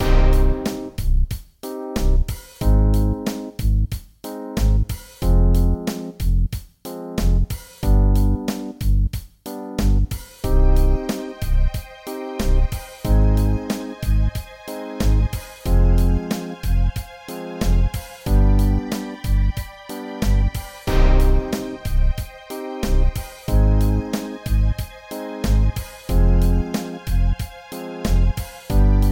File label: rap beat; s rayona